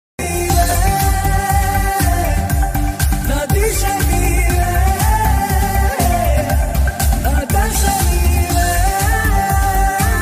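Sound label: sehari love background music ringtone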